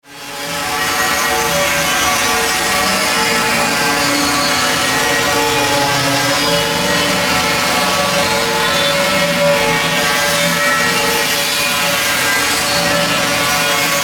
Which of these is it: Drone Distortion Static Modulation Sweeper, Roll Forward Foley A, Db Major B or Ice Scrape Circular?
Drone Distortion Static Modulation Sweeper